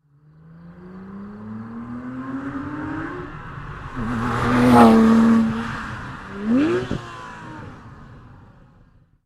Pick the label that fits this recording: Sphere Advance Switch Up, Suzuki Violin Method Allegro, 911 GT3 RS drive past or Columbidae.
911 GT3 RS drive past